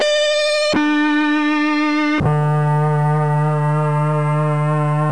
blues tone, guitar samples; Amiga-CD 1996 #5-6; sampler

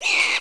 slay squeal; Exile III v1.0

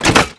sub pen lever pull; Spearhead Demo

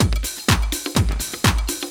DRUM, 125 BPM

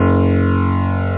esq piano; 8,363 sample rate; Amiga 8-bit Sampled Voice

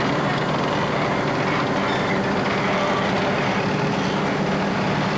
WARE AMB; Messiah; AMBIENT; INDUSTRIAL

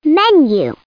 menu

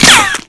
wpn impwood, weapons, impact; Spearhead Demo; MacFormat 2003 June

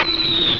Drop; Tic Tac Toe 3D

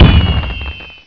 Fireworks; Bugs Bannis; Squish 2.6.8

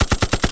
MG Muzzle

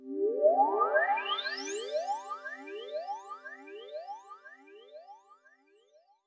Sweep; Digital Video Editing Workshop; DVCAM; VidWave III; VideoWave

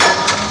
Pile driver; Sfx stack 15

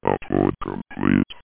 upload complete; simpleftp; Amiga 8-bit Sampled Voice

